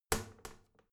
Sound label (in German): toilet lid wall open regular, badezimmer